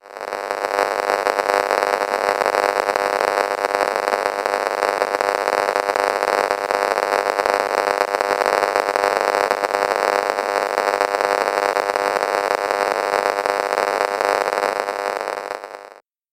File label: Cordless Phone 5.8 GHz